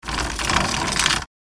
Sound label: Metallic chain pulling rattling